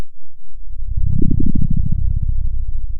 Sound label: Sound of a dinosaur